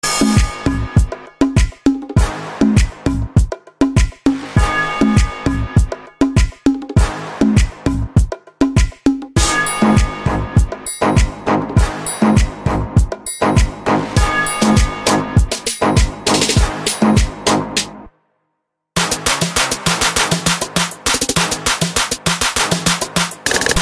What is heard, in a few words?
Arabic Trap